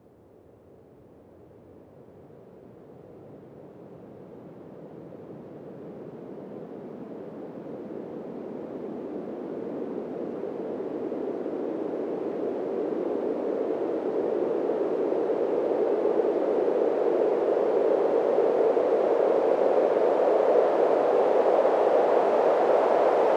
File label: Very Long Sweep, White Noise